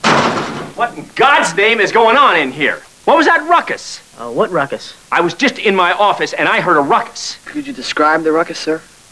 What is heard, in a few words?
ruckus